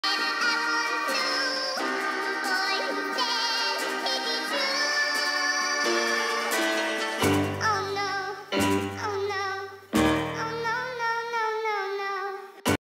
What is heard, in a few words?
BGM ringtone